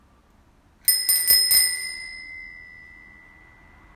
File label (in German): Katzenklingel